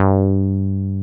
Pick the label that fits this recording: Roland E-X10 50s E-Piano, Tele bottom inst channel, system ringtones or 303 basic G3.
303 basic G3